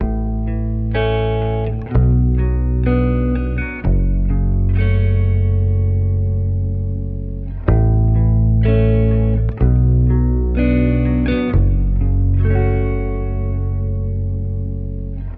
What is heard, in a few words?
guitar, Loops